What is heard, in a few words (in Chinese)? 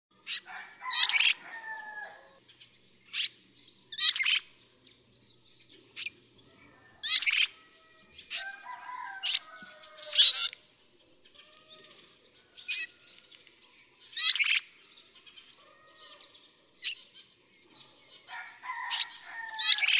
赤腰燕; 燕科/留鳥; 斑腰燕